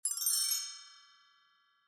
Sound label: tone